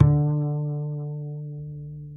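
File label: acoustic bass vibrato D 3, right; Masterbits CLIMAX 7 - Bass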